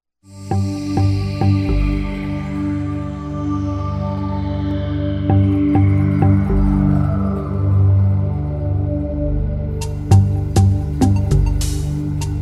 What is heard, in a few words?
trans atmos